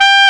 WND HARD, Clarinets, Clar Bryt; Roland LCDP04 Orchestral Winds; 90 s Sample CDs

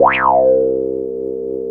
JUP WOW; East West - Phat & Phunky

